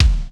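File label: ambient kick, drum; Crypton - DrumBase5000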